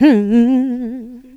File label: Vocals, Adlibs; Houseworx